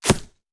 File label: Bullet Impact; Sound Ideas; Guns